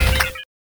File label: Phone Reroll